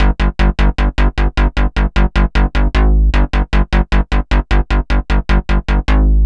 Psycho Bass; Dr. Fink's Funk Factory

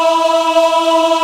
Aahh Chor, VOICE; Best Service Gigapack 1

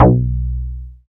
BAS FM DX-7

